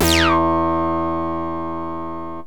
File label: dist, yamaha rm1x synth bass and lead